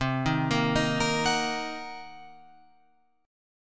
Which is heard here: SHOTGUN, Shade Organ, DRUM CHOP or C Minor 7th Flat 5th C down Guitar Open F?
C Minor 7th Flat 5th C down Guitar Open F